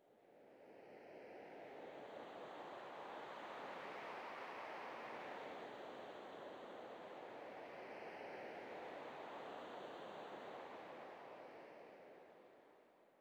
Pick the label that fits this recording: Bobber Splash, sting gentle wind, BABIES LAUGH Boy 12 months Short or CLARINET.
sting gentle wind